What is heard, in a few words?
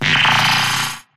Kamehame Charge